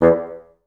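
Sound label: staccato E1, orchestra; Best Service; Peter Siedlaczek's Advanced Orchestra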